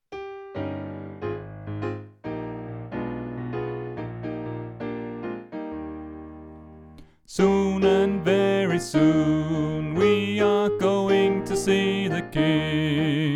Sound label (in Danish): Sopran; oevefiler